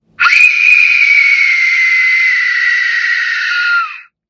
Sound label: Screaming Whistle; sw13b screaming whistle bone